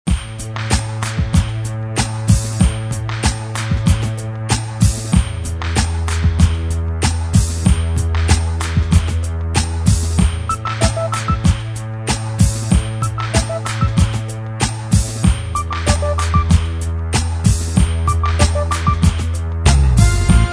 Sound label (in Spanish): TANGANDO; Suite Coll. Vol.9